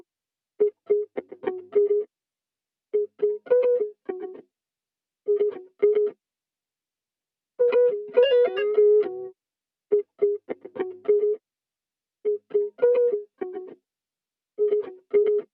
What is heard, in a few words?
West Coast Melody Loop - 103 BPM C# Min Electric Guitar; Melodies; Loop Stems; Cymatics; Oracle Sample Pack